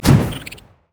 jack in the box; jester

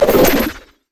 bola strike; LoL; hunter rengar

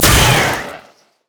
glacial spike; LoL; items

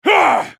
ultimate effort; Garen; Character Voices - English

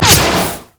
q attack; LoL; jinx base

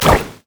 q spell; LoL; ziggs poolparty

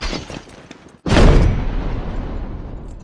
emote laugh; nasus

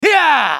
spellcast effort; BlindMonk

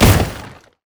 frost flamebreath; LoL; shyvana

